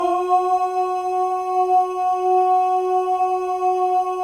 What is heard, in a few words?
MALE OHS; Spectrasonics; Symphony of Voices